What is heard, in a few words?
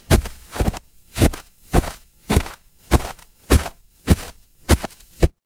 footsteps snow